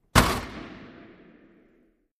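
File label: Hockey Penalty Box Door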